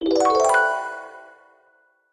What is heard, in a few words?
jingle switch; Flat Kingdom Papers Cut Edition